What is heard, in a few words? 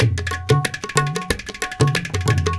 India, Heart of Asia